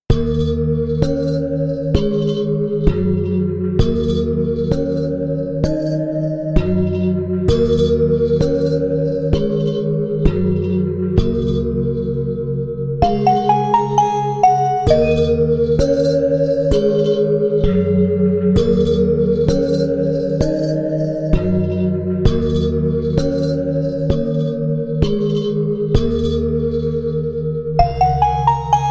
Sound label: atmosphere